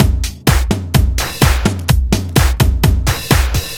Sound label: Trash Floor, Drum Loops, 127 BPM; Sonic Foundry (Sony Creative Software); Underground UK House & Electro